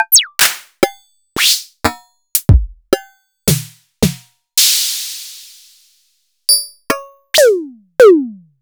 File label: Sony DPS-F7 Kit